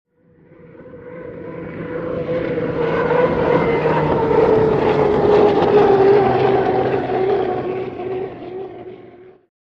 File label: Hydroplane By Fast